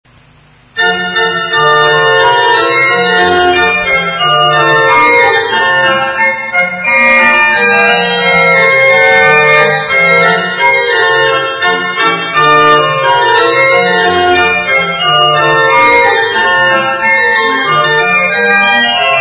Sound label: tune